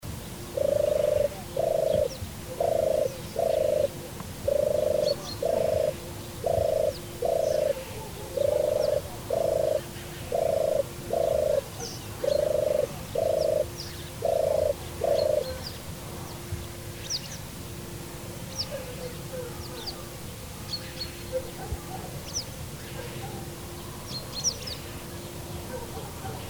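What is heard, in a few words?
European turtle dove voice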